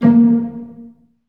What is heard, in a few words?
STR SEC, Orchestra; Roland - LA Composer vol. 1